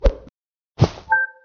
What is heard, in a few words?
Dwarf mine